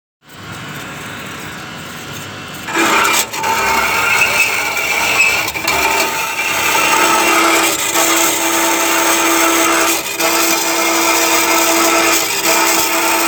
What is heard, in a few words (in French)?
Scie a ruban